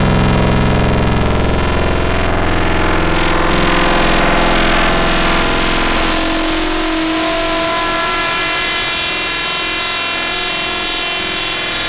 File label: FM - Pig Squeel; Wavetables; Synthetic's Serum Collection; ESW Core Wavetables; Echo Sound Works Core Tables